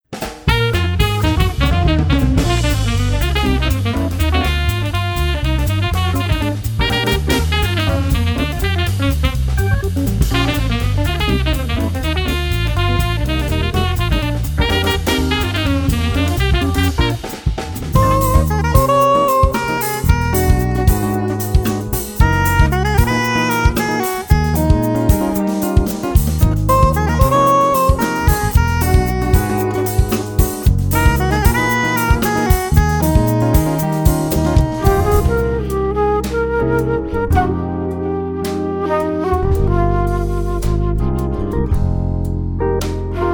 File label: Jazz Medley